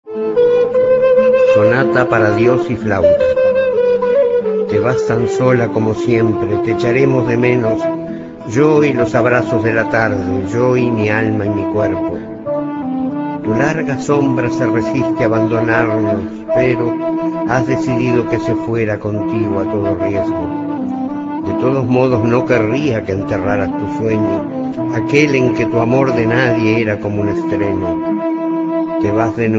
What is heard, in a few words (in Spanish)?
sonata para adios y flauta